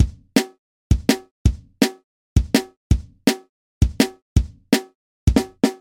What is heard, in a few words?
Monkey Beat